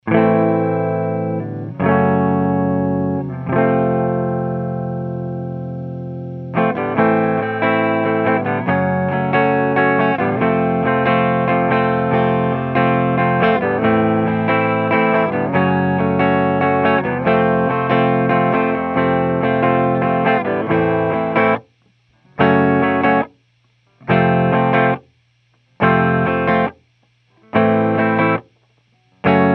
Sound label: AMP Clean R122, clean eguitar